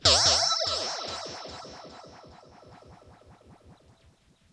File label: RIPPLES L, Funk Guitar; 90 s Sample CDs; Zero G